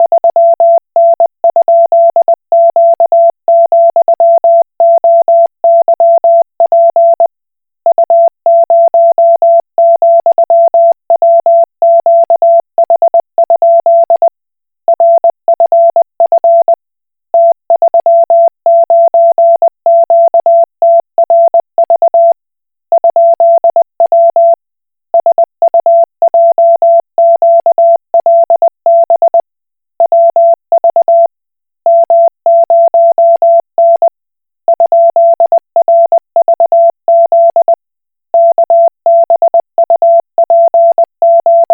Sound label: Koch Morse